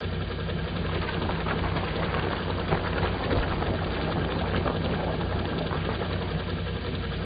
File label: electric mining drill; factorio 2.0.55